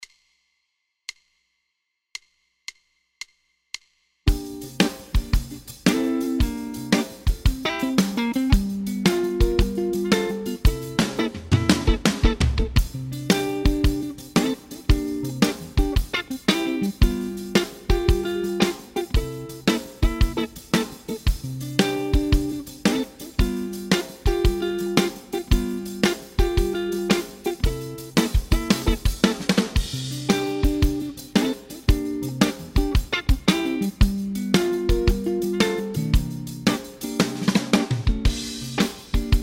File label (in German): O Tannenbaum Blues